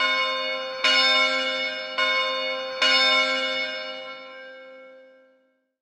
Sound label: church bell